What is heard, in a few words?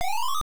Credit SFX; Gulf Storm; arcade